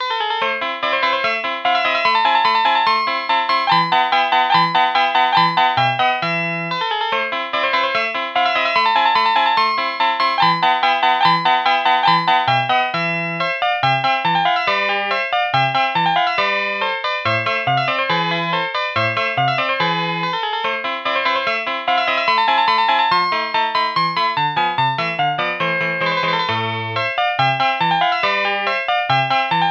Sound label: jazz guitar